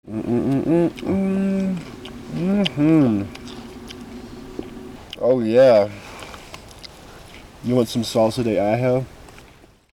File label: salsa